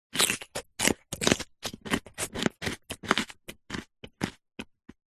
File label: human eat bite carrot raw munching; zapsplat